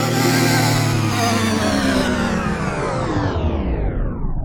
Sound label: Power Cut, Machinery; Sonic Foundry (Sony Creative Software); Myths of Technology